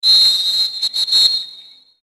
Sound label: whistle; csgo